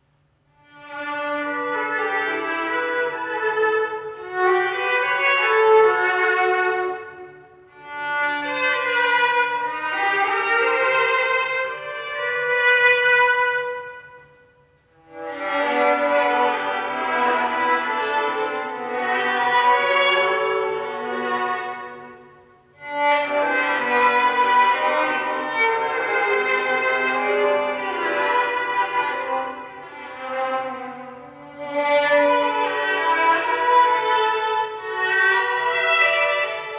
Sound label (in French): Suite en duo pour violon et alto; 4. Air pour chanter